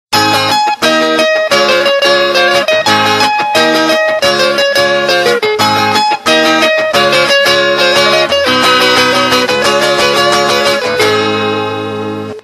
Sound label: Guitar